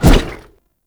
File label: Shield Swing; Warframe; Grineer